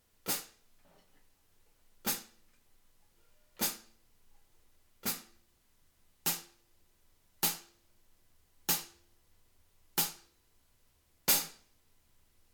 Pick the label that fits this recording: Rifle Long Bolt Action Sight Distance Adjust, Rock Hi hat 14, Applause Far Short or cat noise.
Rock Hi hat 14